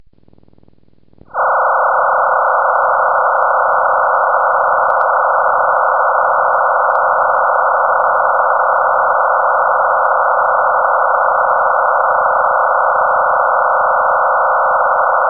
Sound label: MT63 Mode